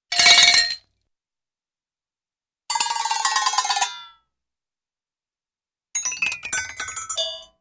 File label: Funny Head Shake & Cowbell Shakes, Cartoon; Hollywood Edge; Cartoon Trax